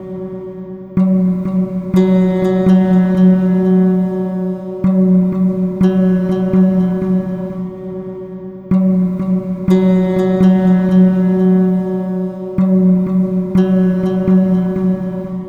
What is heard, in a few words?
Ambient Melody; Drums & Drones